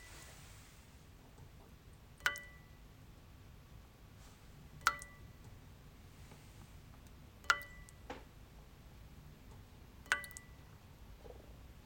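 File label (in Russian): капает вода в металлическую чашу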